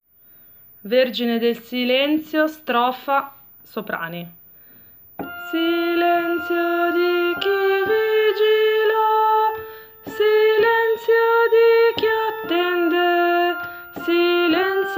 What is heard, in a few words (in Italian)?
strofa soprani